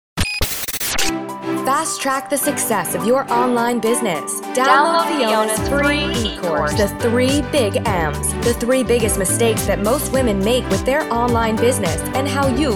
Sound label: Bumper